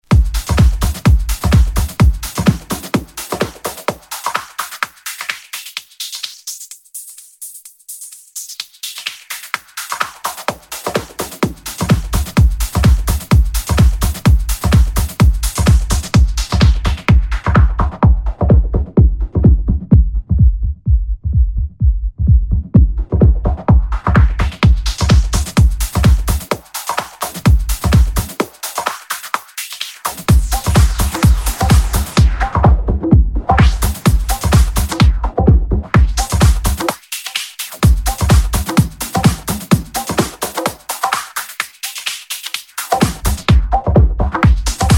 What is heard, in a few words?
filter